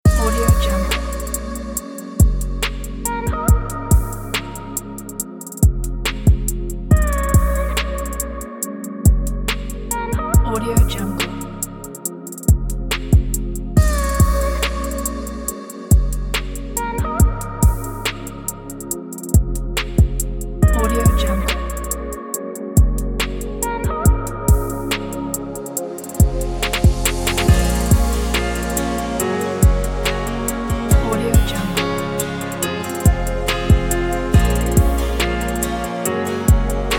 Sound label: pop rb